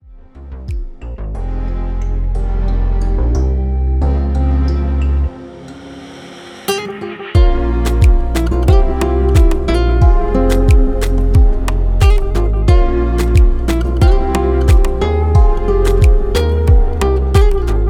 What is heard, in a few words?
Pop Latino; Le Corbeau d'Argent Production